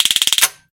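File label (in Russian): непредвиденная осечка космического бластера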